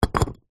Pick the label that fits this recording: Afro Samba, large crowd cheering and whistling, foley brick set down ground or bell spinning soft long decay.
foley brick set down ground